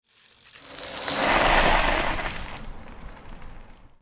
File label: Bicycle bike stopping and braking with a squeak